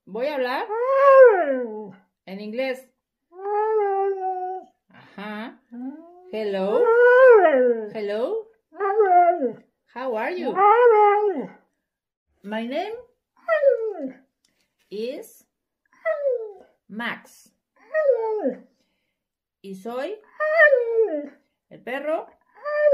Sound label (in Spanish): perro hablando ingles